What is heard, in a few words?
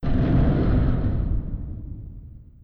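Collision Exterior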